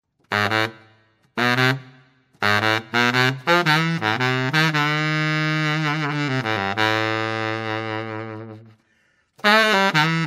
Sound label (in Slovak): baryton sax; Eb Barytón saxofón